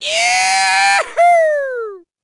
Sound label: Yeeaahoo!; gameplay comment good